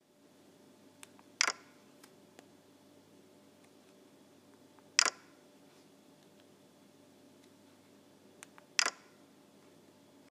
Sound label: ios 10 beta 5 iphone locking sound